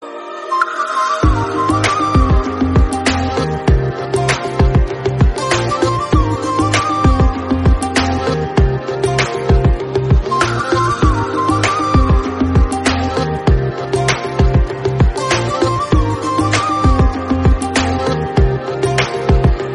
flute

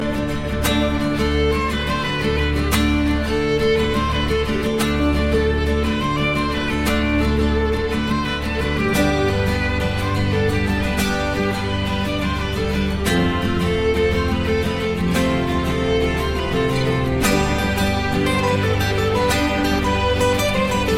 florence tarantella; assassins creed 2

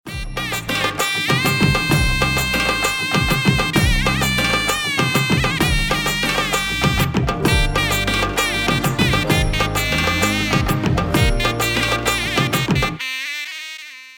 indian marriage tone

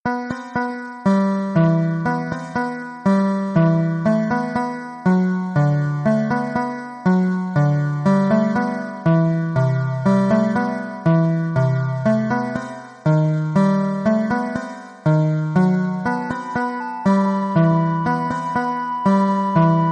romantic

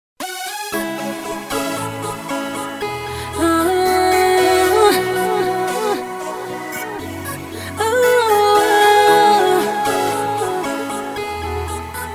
ultimate music tone

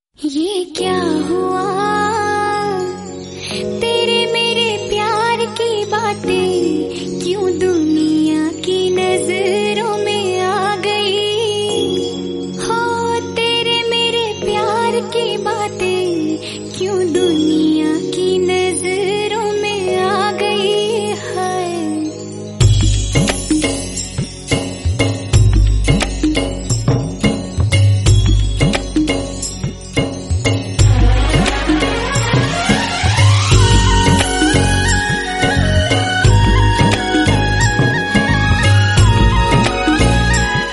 melody

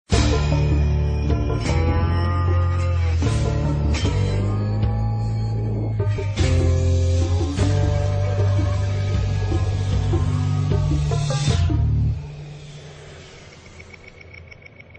theme ringtone; breaking bad

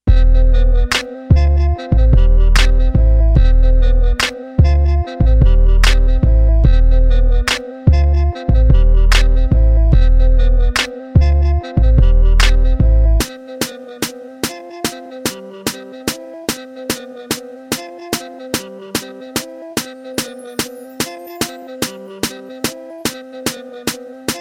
instrumental

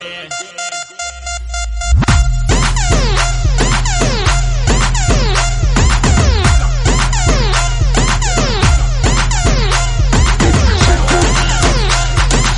hip hop tone; kaththi

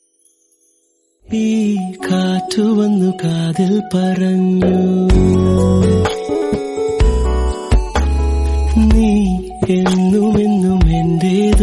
malayalam romantic song

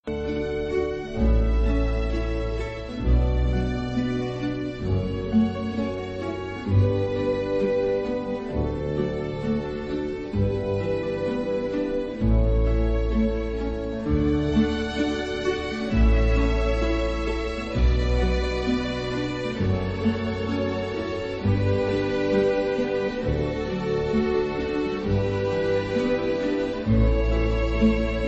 canon in d; pachelbel

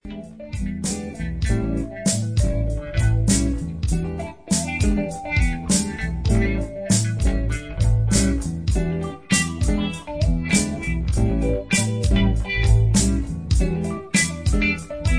romantic

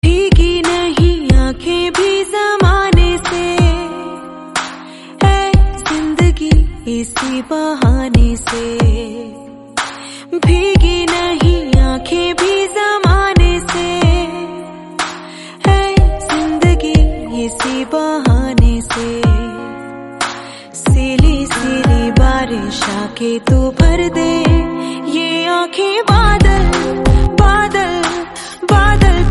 song

